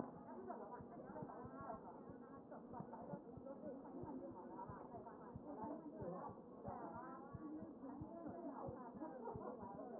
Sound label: chest